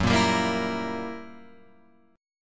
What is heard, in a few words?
Gb 7th Flat 9th, Guitar, Open F, Gb7b9 chord; strummed; JGuitar